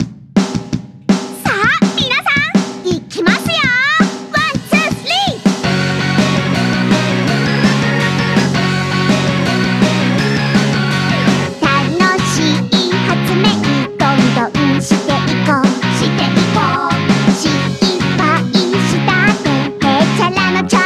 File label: BGM Idol Powerful beat; tamagotchi no doki doki dream omisecchi 3ds